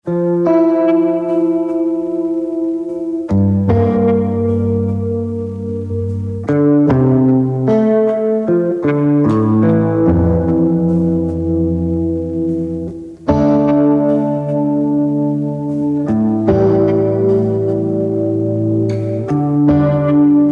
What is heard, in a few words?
electric lullaby